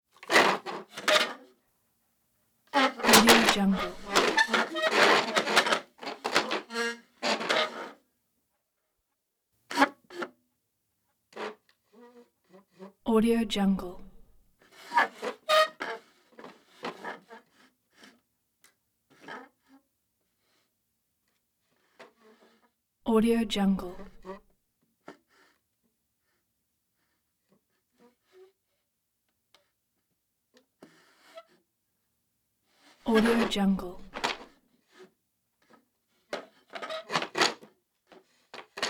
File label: bar fight foley wood chair violent scrapes; prosoundfx